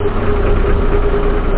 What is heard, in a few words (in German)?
LKW; ASSAULT